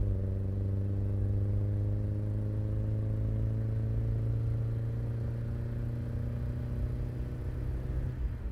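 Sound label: engine low loop; Blind Drive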